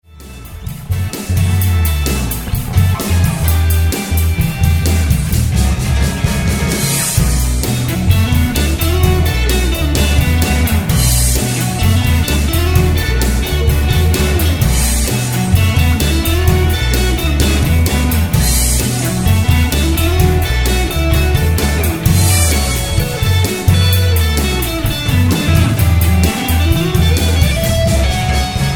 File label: Groovy